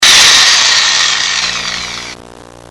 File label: drill; machine; motor